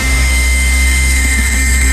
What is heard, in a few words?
whoosh